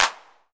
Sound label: hand clap c sharp key, Royality free clap tuned to the C# note. Loudest frequency: 3891Hz; percussion; single hits one shots